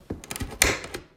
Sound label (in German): wood koernung